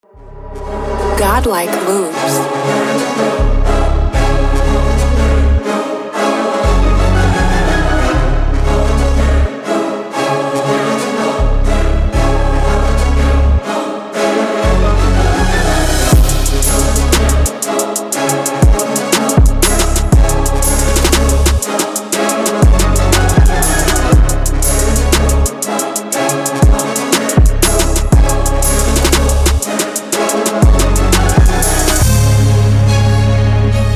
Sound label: epic vibes